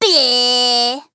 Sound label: blerp; hat kid; a hat in time